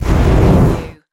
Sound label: Flame Booster Short